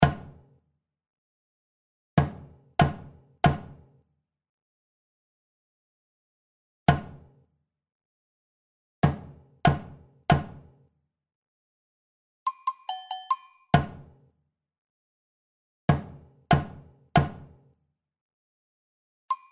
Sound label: Blues Batucada